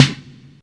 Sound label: Analog Snare; EMU MoPhatt